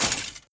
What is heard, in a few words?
step, footsteps, player, metal chainlink; Half Life Alyx